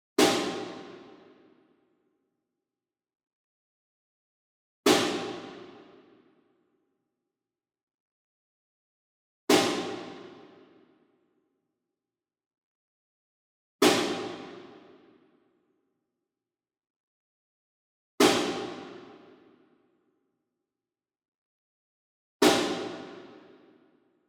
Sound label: SINGLE SNARE Pearl Marching Hit Far; boom library; Cinematic Strikes Construction Kit